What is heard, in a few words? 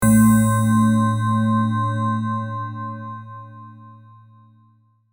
Meditation bell ring chime tone